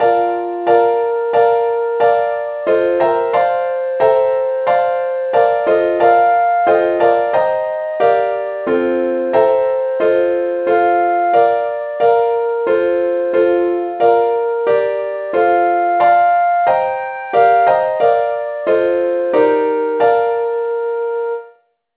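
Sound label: advanced lead key b flat; saintthomas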